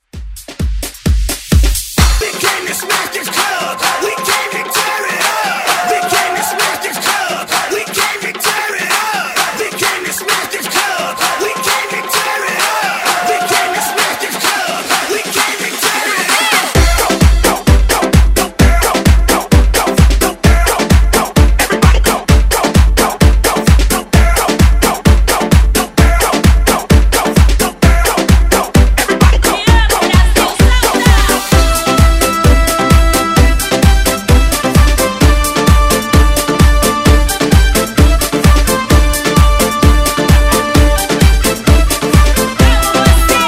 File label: Afrobeat Hype Intro Redrum